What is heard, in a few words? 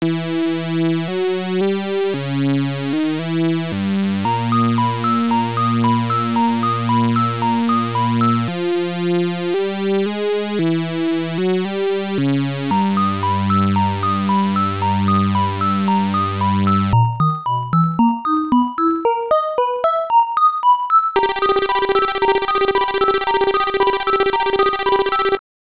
Monster's Mansion BGM; Pac-Land; X68000